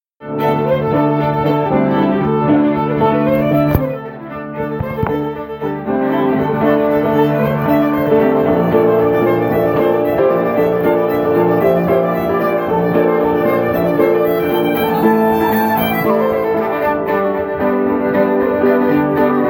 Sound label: Ceili Band Reels